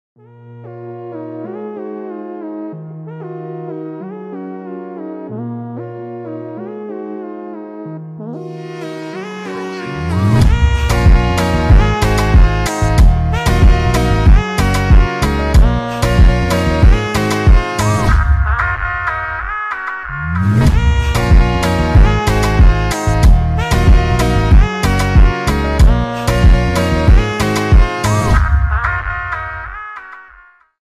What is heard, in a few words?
instrumental